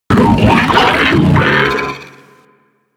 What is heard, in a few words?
Sentient Attack, Enemies; Warframe; DE; Sentients; Ortholyst